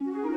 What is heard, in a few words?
Joyful Start 60bpm; Music Dialogue